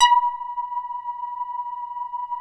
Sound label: LEADS; Masterbits; Vintage Voltage